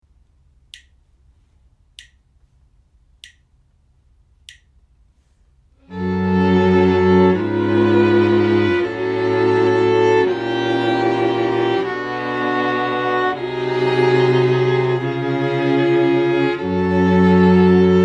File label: harmonic dictation; eartraining